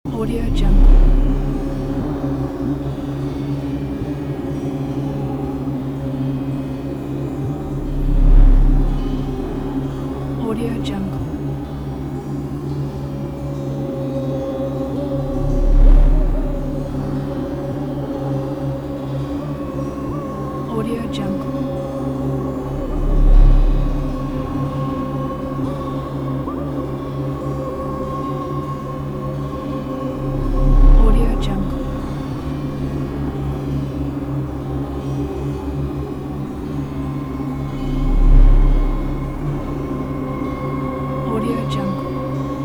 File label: horror ominous dark background